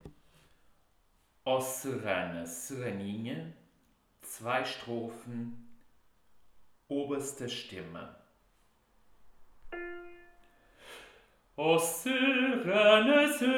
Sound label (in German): O serrana oberste Stimme